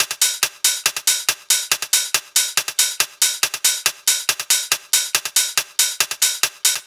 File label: NRG Cymbal